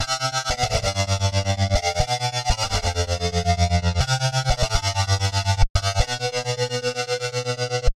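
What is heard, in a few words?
Guitar Tremolo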